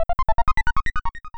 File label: warp; mother2; Eden